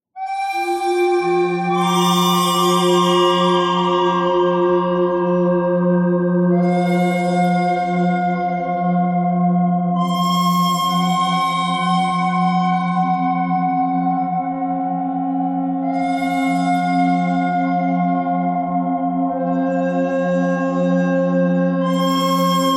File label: bird thread buzz all; birds